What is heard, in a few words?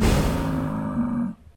bison spawn; Risk of Rain